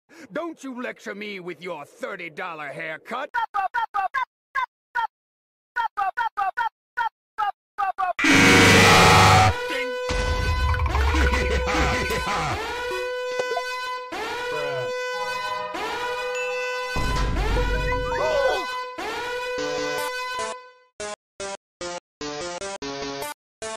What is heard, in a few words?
goofy ahh alarm; AVERAGE ALARM CLOCK IN OHIO